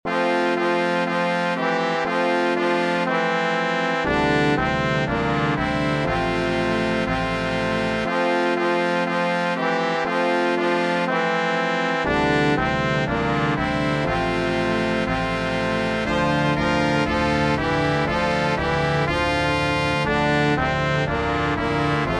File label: Good King Wenceslas, brass